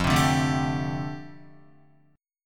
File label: F 6th Add 9th F down Guitar, chord